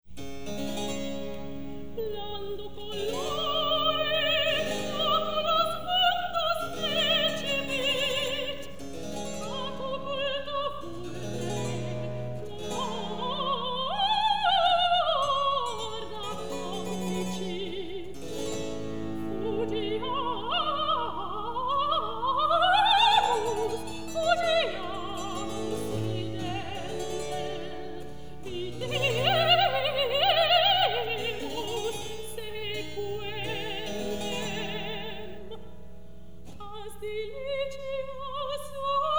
Motet for Soprano and Orchestra recitativ; Advent Chamber Orchestra; Vivaldi; December 2006 Concert

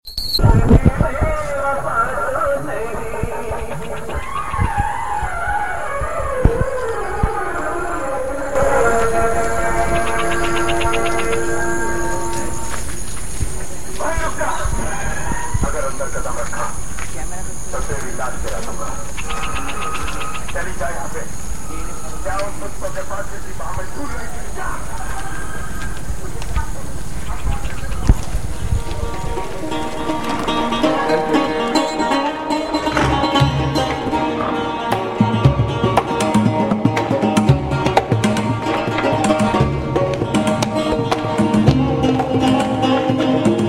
radio banjo locust, Field Recordings from India; WFMU

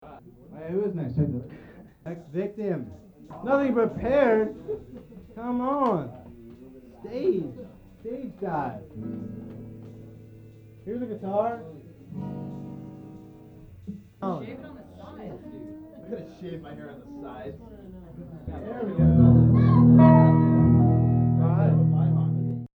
Finger Pickin

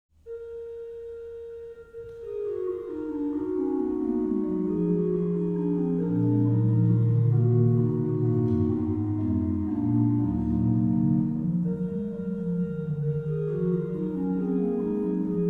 Bach Organ Works; Clavierbung III; BWV 683; Vater unser im Himmelreich

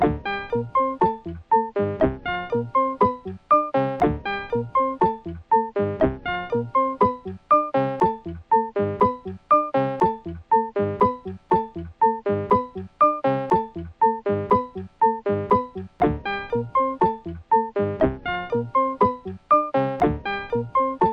Split Sine Waves; Instrumental music from Billiards

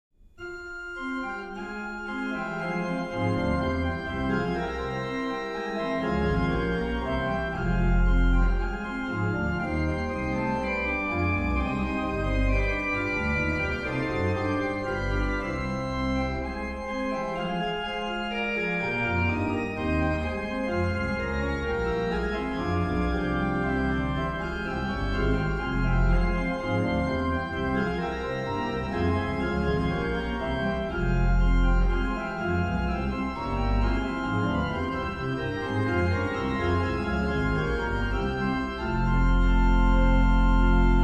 Bach Organ Works; BWV 640; In dich hab ich gehoffet Herr; Orgelbchlein

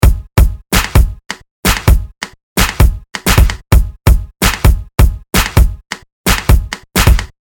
ragga beat; Loop Mania Sampler Pack

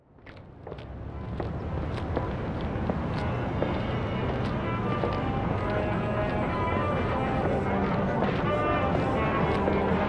All have sax in an open night club doorway

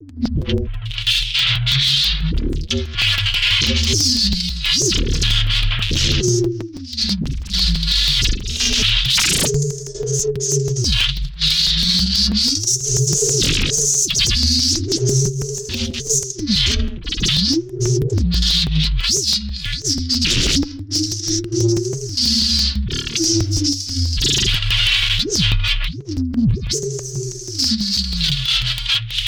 kick explorations

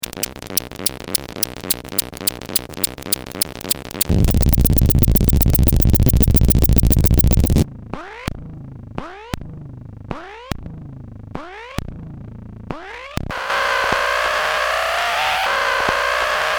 Yelp, Car Alarms

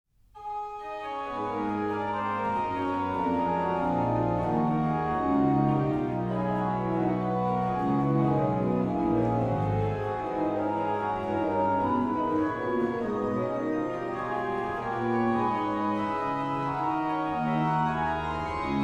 Organ; Bach Organ Works; BWV 624; Hilf Gott da mirs gelinge; Orgelbchlein

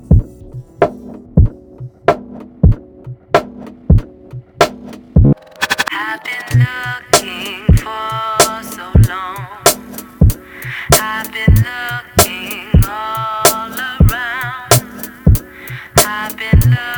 Drum Nature

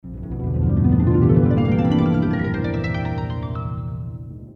Harph Jingle; Jingles for films podcasts

